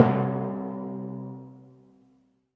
timpani hi; Western and Latin Percussion; single hits

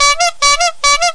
squeaky clown horn; Alarm Sounds

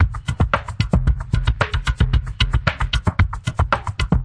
113 wavedrum filto

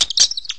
freaky squeaky thing; Weird and Interesting Hits; single hits

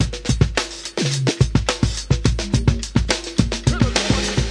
broken break, 106 bpm, drum loop; 101 to 110 bpm

